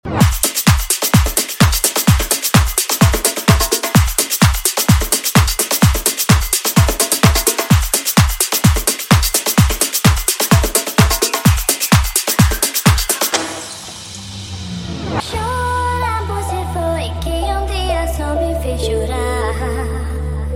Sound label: Afrobeat House 128Bpm